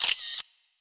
camera; Garry's Mod; tf2

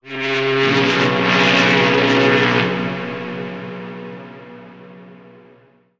ship horn; Garry's Mod; tf2; mvm warehouse

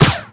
crit hit mini; Garry's Mod; tf2

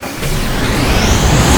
doomsday cap down; Garry's Mod; tf2

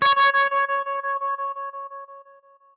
class menu, music; Garry's Mod; tf2

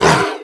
gloves attack; weapons; breadmonster

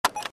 lounge button press; taunts; engineer; tf2; engy

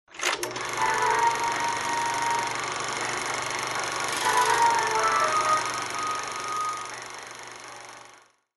start record; tf2; garrysmod

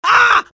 scout pain severe

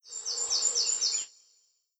small bird; ambient; Garry's Mod; tf2; rottenburg

